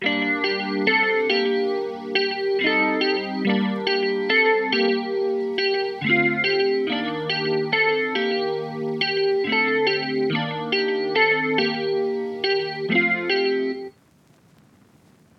Gm 140bpm; Faked

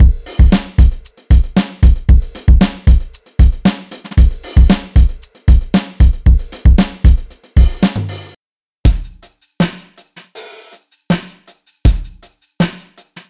Drum Country; Drum Monkey; Unison MIDI Wizard Plug-In; Drum Demo

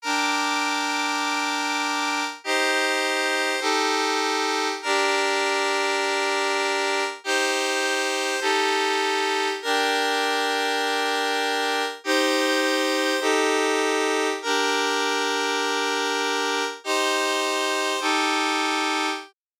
Chords

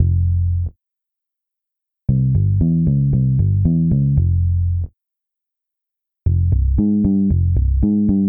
Country

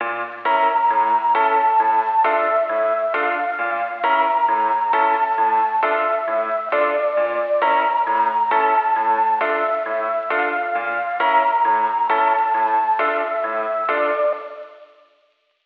Melody

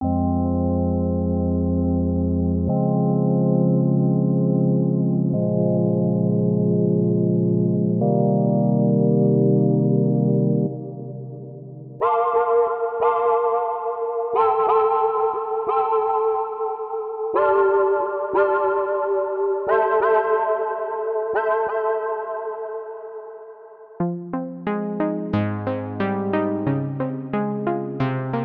Neo Soul

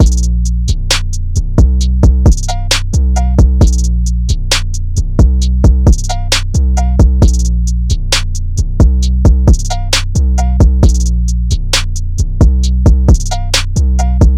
133 BPM Bbm; Dippin

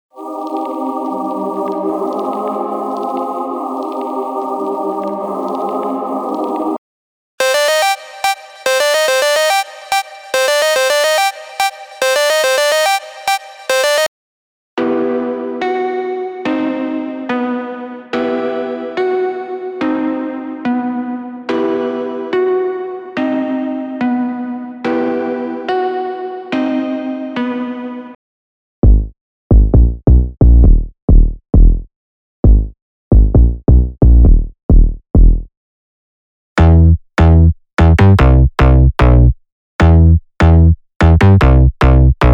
UK Drill & UK Grime; unisynth

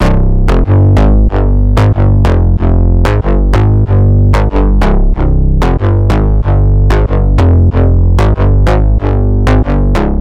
187 BPM Fm

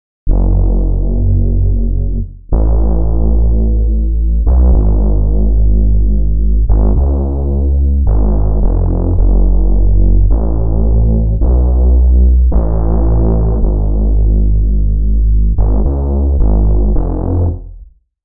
Bass 808s Warm

